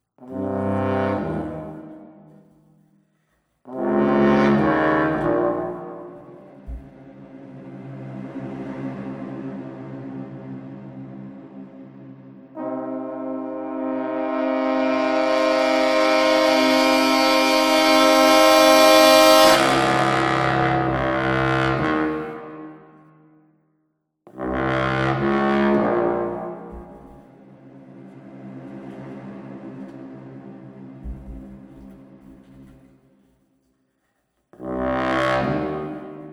Low Intensity Action Loop Second Layer; Battlefield Bad Company 2

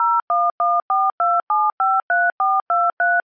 phone; Buggy; PS1

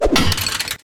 towtruck attach

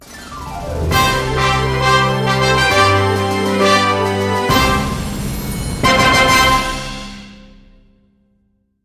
Fanfare Only; Yoshi's Woolly World

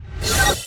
Soul teleport in

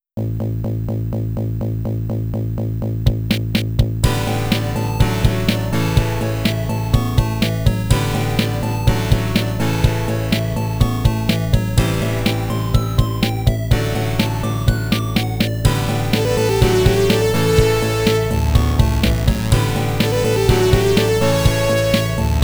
Start Interval

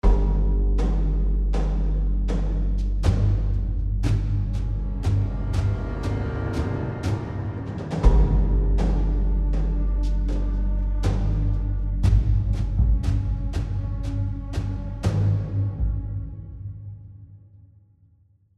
Tension; The Last of Us; AB Tension 3 High Chunk

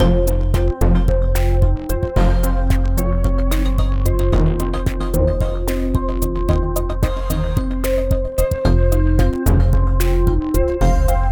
Tense; Everspace 2

